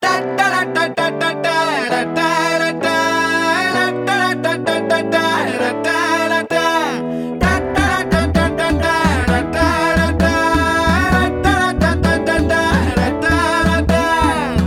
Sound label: Cricket Song Bgm